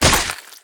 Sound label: limb slash; Barotrauma; Subsurface; Damage